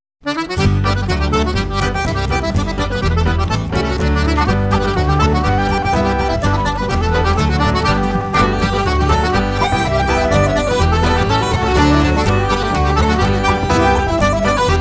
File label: Polka; Fitzmaurices